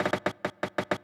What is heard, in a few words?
fill, Drum Kit; Crowdsourced Sample Pack; Fills